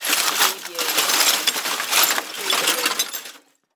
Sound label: Debris Glass Plastic